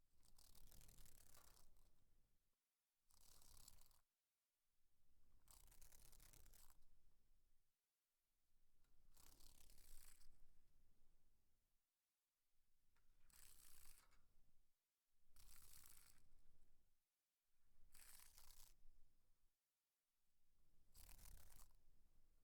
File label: trapped dragon fly